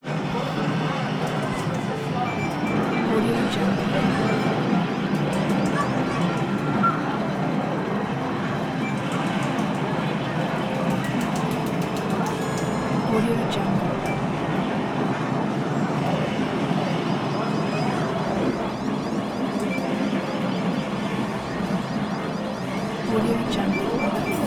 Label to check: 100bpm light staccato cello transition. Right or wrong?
Wrong